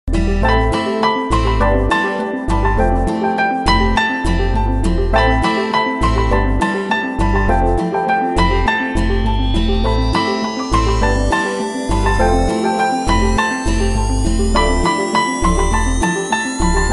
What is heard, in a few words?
piano marimba ringtone; bollywood ringtones